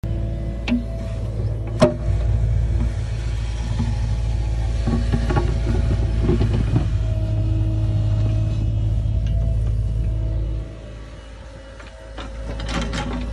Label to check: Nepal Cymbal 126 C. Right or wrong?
Wrong